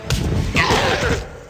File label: raptor hurt